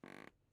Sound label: Wood Creak